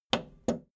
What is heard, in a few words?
video tape player plastic rewind click slow